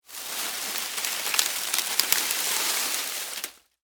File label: Foliage Crunch, Movement, Palm, Frond